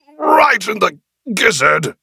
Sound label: Death; battlerite; Vanguard